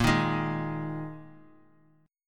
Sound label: A sharp 7th Suspended 4th A sharp; strummed; JGuitar